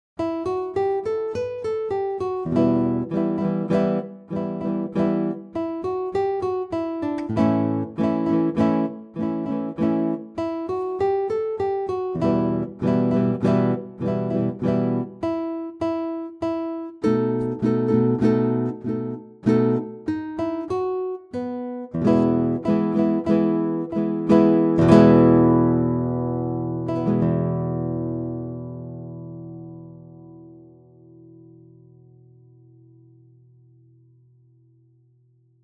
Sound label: Strumming